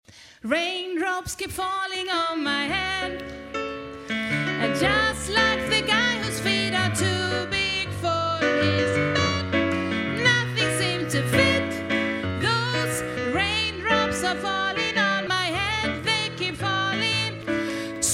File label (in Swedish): sopran